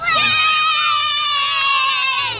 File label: "yay", kids cheer; ooh ahh